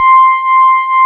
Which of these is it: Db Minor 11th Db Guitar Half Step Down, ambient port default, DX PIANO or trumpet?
DX PIANO